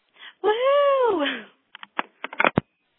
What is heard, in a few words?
WOOHOO